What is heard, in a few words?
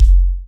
BD, DR BD; Best Service Gigapack 2